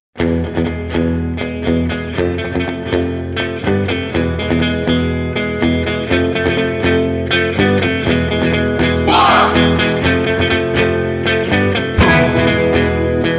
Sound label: Burst; 예능BG